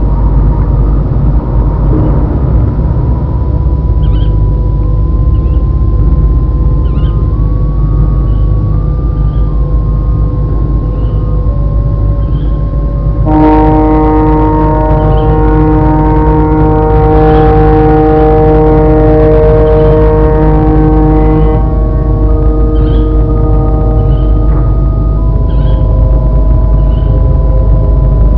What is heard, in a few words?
ship; quake2